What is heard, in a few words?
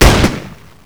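Kar98 fire; WAR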